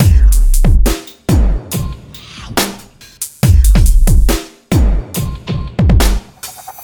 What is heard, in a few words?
dubstep loop; Butcha